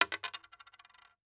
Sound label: Household Coin Dime Dropping On Wood Table